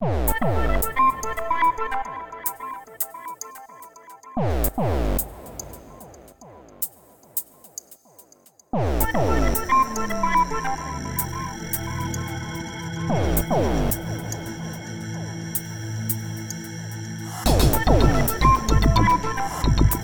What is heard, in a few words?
vsti, sounds; Emagic EXS MkII, NI FM7, NI Absynth, E-Phonic Invader, Novation V-Station, Emagic EVD6. misc. Fx.; VST-Software generated demos